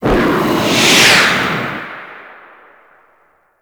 Boost Thruster Warp; Warframe; Archwing

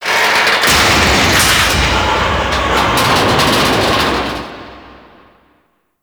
Gas City End Destruction Final Release; Warframe; Corpus; Ropalolyst

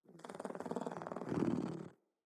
Kitten Growly Purr; Catbrow

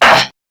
Pain Crewmen; Warframe; CorpusCrewmen; CrewmanA

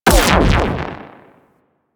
Railgun Fire; Warframe; Corpus; Corpus Railjack; Biped Railgun

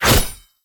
Bayonet Melee Whoosh Fast; Warframe; Tenno

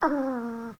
Kitten Snore; Warframe; Catbrow

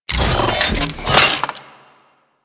Turret Deploy; Warframe; Corpus; Corpus Railjack; Admiral Agent

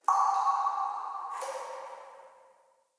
Root Cave Magic Drip; Ambience; Neathuns Root Cave

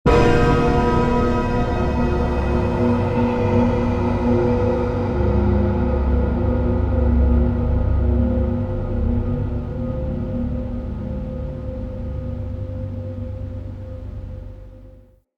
Bell Puzzle Ring A

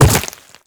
Infested Footsteps Quadruped Medium Land Dirt; Warframe

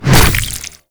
Infested Ancient Tentacle Swing Hit; Warframe

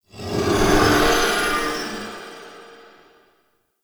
Eye Teleport; Ambience; Gameplay; Warframe; Orokin Moon

